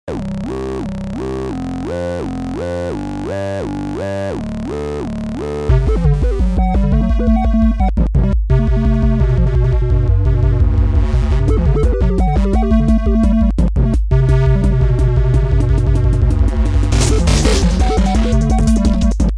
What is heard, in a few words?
car commercial music